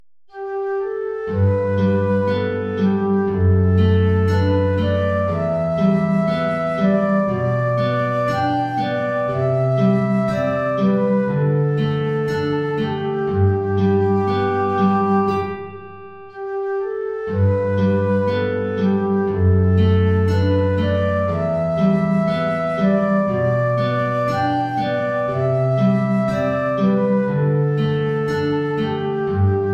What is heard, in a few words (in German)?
Querflöte & Gitarre; Down by the Salley Gardens